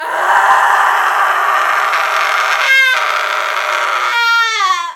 women scream; افکت صوتی

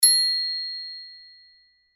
Ding; افکت صوتی

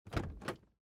Open the car door

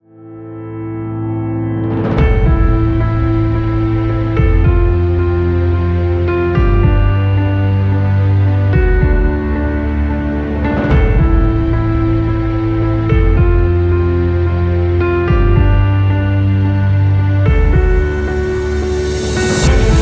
Epic Piano

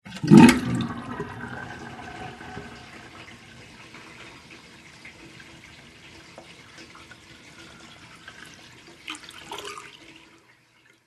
sink

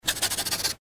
Drawing with pencil and marker

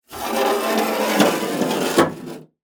wood scratch; افکت صوتی

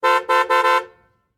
car horn

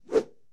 swoosh swish; افکت صوتی

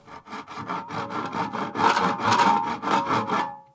wood saw

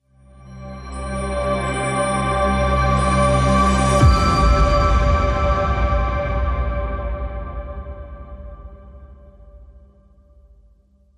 logo end

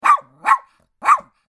puppy; افکت صوتی

